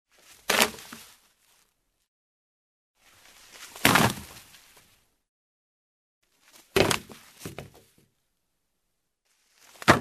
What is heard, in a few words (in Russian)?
padenie kanata